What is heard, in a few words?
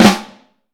FLAM, Vintage Snare Drum; Q Up Arts; Johnny.C's Vintage Snare Drum; 5X14 LEEDY